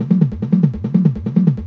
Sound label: 143 bpm techno whup whup, drum loops and breaks; 141 to 160 bpm